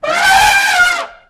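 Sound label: Elephant Single Short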